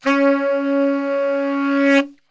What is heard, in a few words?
C#2 SAX, Brass Super Section; Best Service